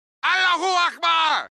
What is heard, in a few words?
Allahu Akbar Clock